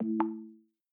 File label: Pairing Success; Peloton